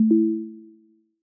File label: select